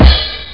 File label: cymbal